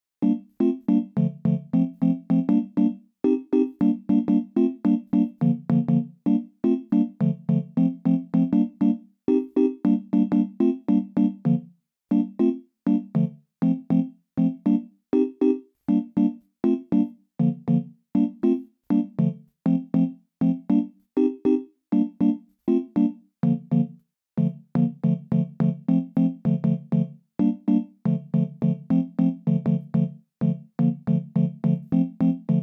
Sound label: Chords; chippy